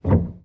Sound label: piano